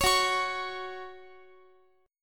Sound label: F sharp 5th, down, Guitar; strummed; F#5; 4E,4A,5D,5G,5C,6F